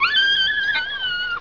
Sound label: die; hungerhound